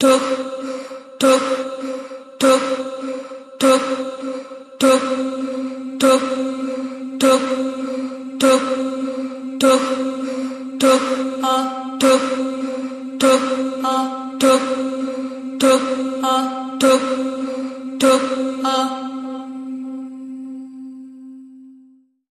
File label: Vocals Suspense Pulse